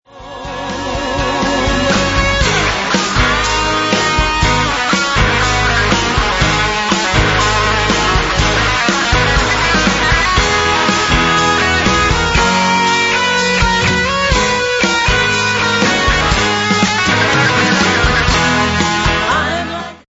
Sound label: lead guitar